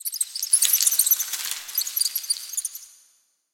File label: rat panic, underground